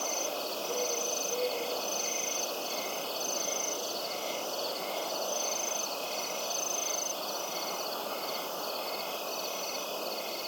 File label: Ambience Night Full